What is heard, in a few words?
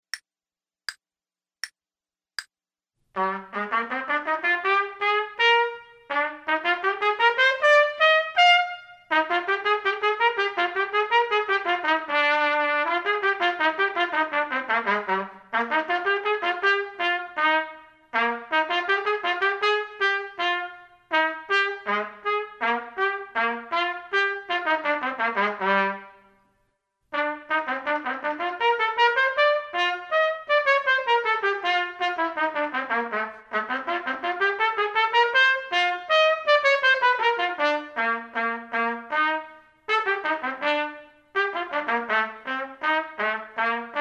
trumpet; Selected Duets for Trumpet Podcast